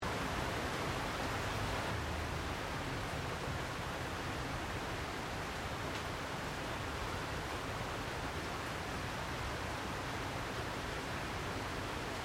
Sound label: Ambience Tropical Thunder Storm; Regional Ambience; Outdoors